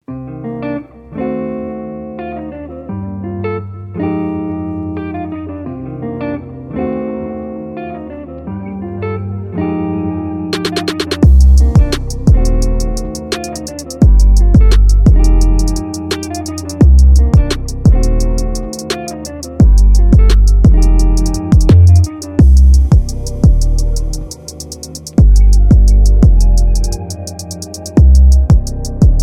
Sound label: GTRnB 86